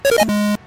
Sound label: beepity boop